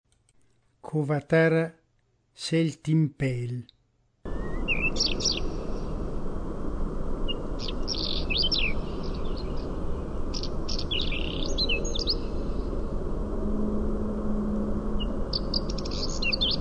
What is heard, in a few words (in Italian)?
Saltimpalo